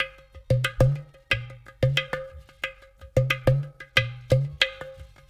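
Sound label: Ghatam, World Groove; Ambient Grooves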